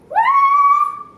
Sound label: waaaaaa